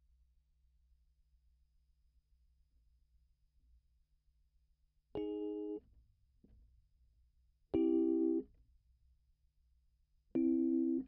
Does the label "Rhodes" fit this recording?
Yes